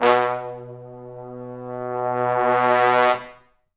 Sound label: Pop Brass; Best Service ProSamples vol.19; AKAI